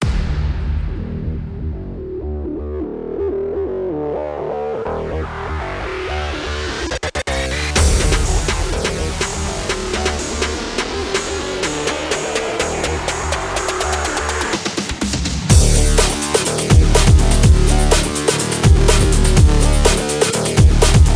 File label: crazy race acid breakbeat